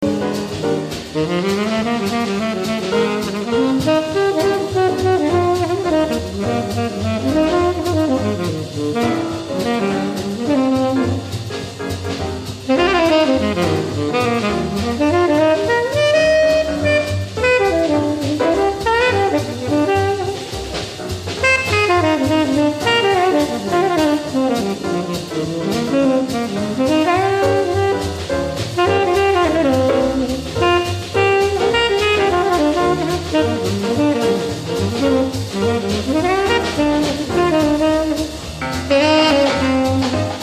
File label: solo